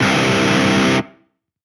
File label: bagel; deltarune yellow translation